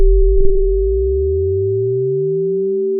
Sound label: pop, Sound of a church bell